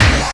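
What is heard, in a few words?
snare drum, kick + snare; Best Service; Hyper Dance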